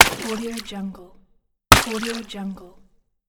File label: water punches